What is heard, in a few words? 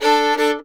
FIDDLE FX; Sampleheads; Whole Lotta Country 1 & 2